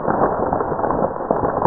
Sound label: gravel; stunt rally; surface